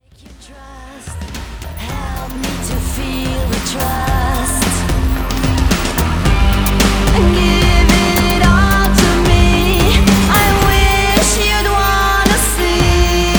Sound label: Scream